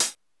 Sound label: Electro Samples 909CHH; Central Processing Unit; Electro Samples Vol. 2